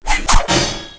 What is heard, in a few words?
Duel Window